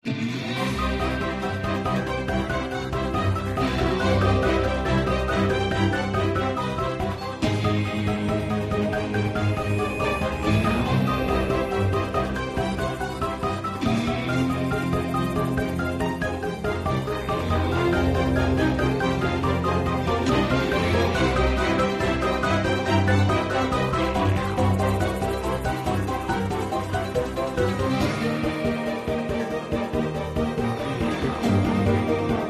Loop; tales from the borderlands gamerip 2015

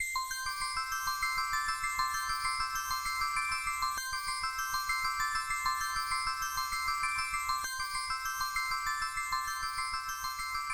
Pick-Up Effect

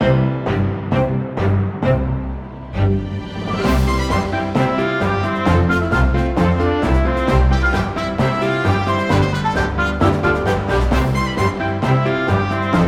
Boisterous Folk; the great ace attorney chronicles; A Farcial Comedy

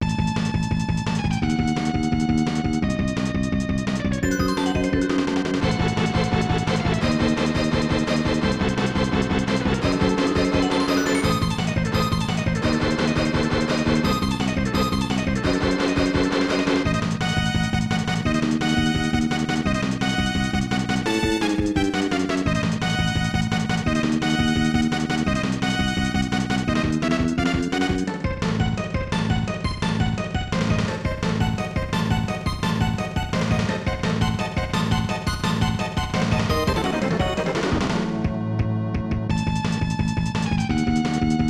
Dark Priest Battle; Shonen Ninja Sasuke

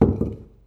drop wood

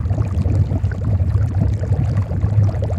Water Filter Fill Loop

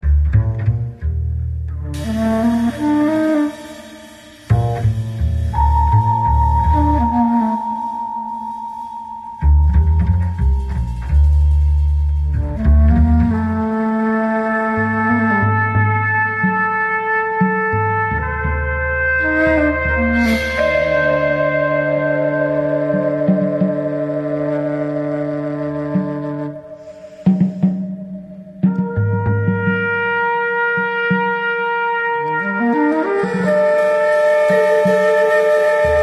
Noire Ambience; l.a. noire the lost tracks

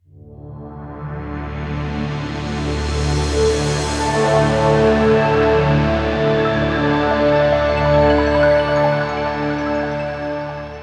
Raise Water Level Fanfare; Spooceshrub Forest Intro

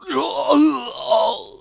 die; super trench attack 2

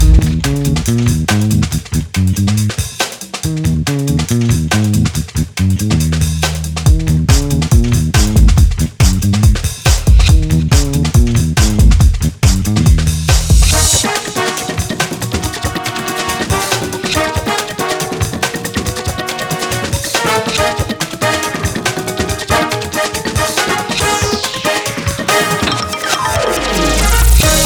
Funk Ingame 140bpm; Funk of Titans; Wii U

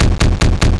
knock; misao gamerip

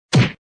Body Hit

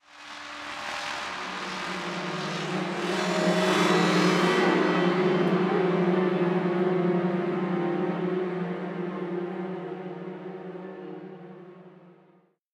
Stinger Intensity; The Park

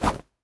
Swing Punch; Afterbern Democralypse; Windows

